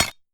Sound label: duang